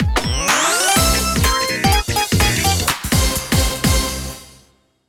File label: Banner Jingle; Rhythm Tengoku; Wii; Rhythm Heaven Fever